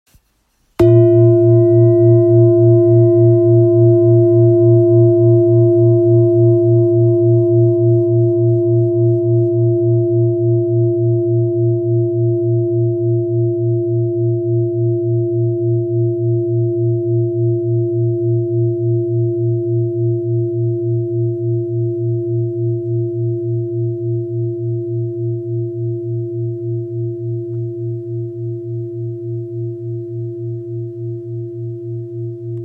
Bol gravure arbre de vie la diese

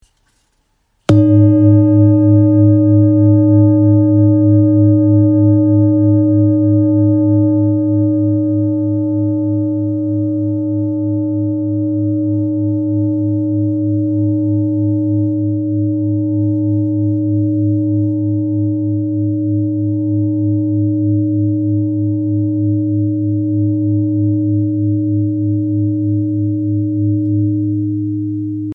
Bol chantant full moon 2.245kg fa

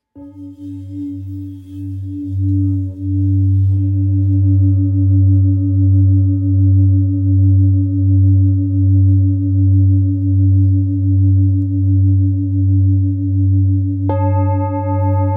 Bol devjam 2.2kg fa diese

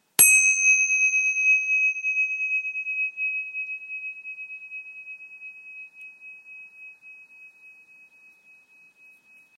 Cymbales 7cm plain

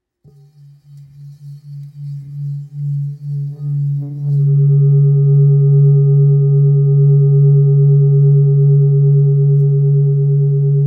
Bol therapeute do

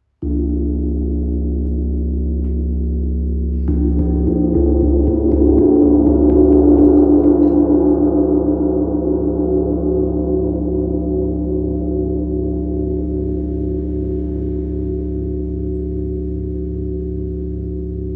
Chau gong 90cm do diese

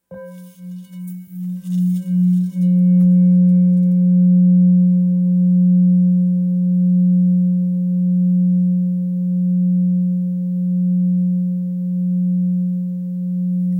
Bol thick fa diese

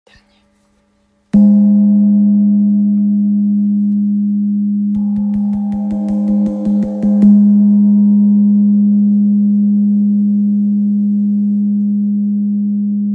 Chau gong sol diese 40cm

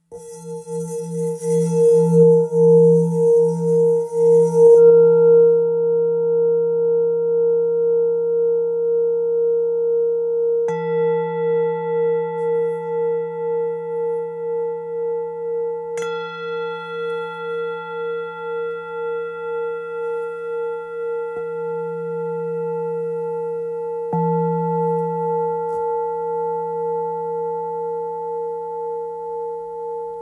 Bol ancien mi 635g 16cm

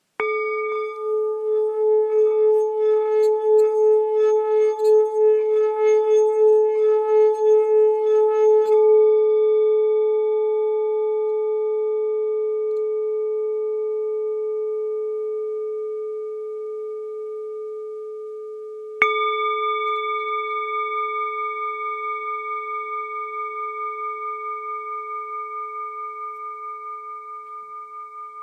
Bol bengale ré dièse

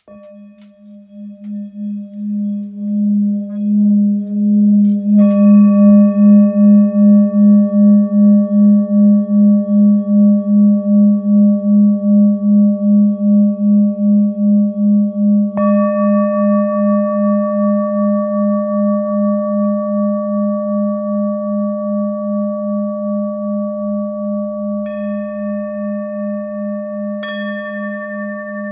Bol thick sol diese

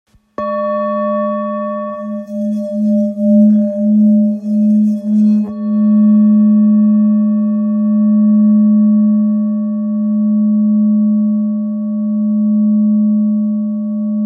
Bol ancien re

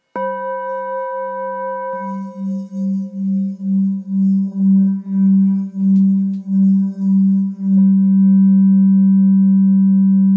Bol ancien sol

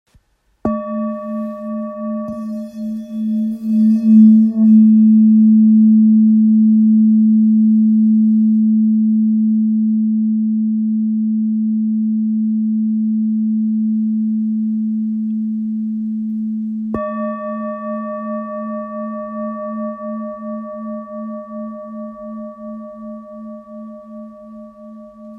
Bol ancien la

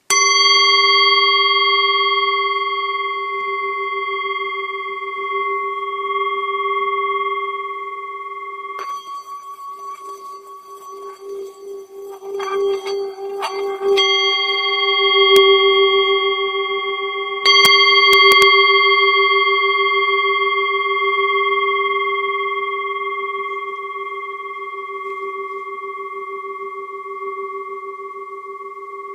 bol bengale Sol

